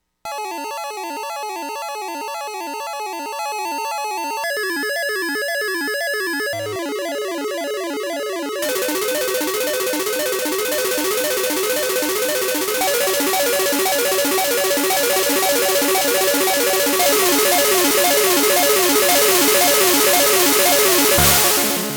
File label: FPGA GB no filter; gameboy sound samples